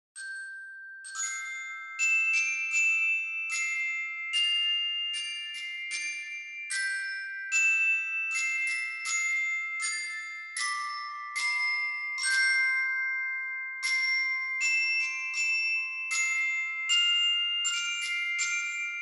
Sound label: Forgotten Melody; the last door collector's edition